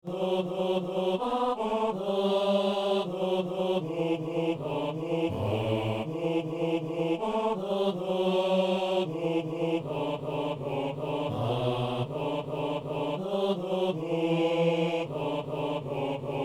hommes; Saint-Denis

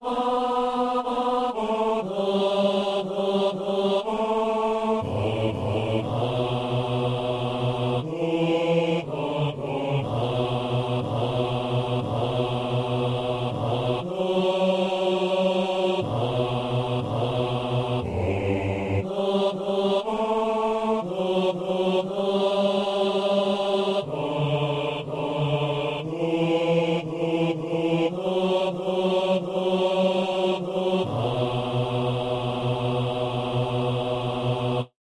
basse; Anima Christi

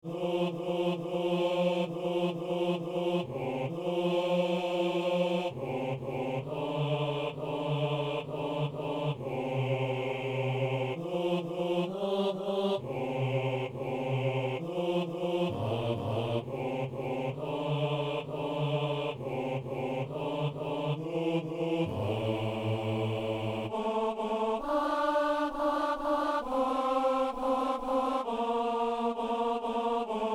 basse; Notre Père (Maurice Duruflé)

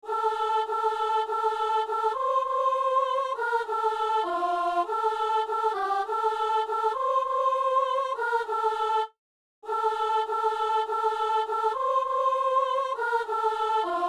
les anges dans nos campagnes, soprano; Messe du 17 janvier à Saint-Denis

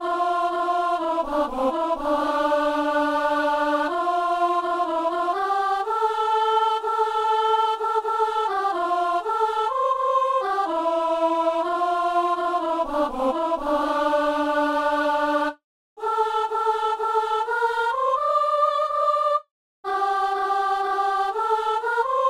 rendons gloire à notre dieu, soprano

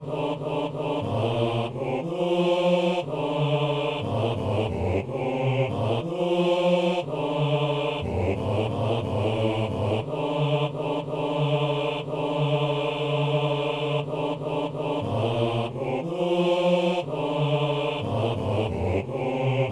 messe simple, gloria, basse

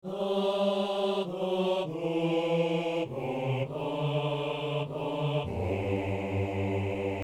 basse; Refrain pour l'élévation

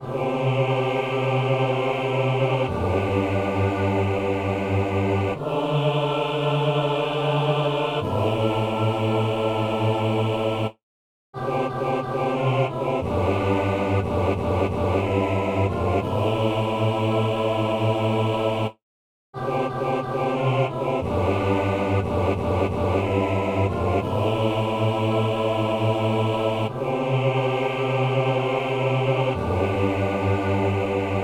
basse; Messe de Saint-François-Xavier - Agnus

